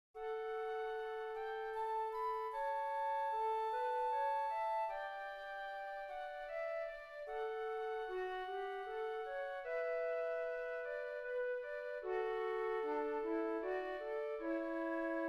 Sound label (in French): FLUTES